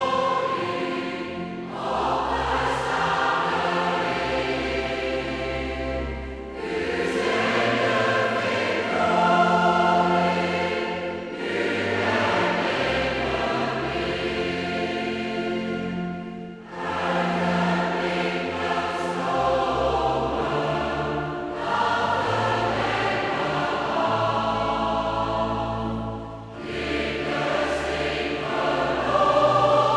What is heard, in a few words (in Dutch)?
Koor gemengd; De muziekale fruitmand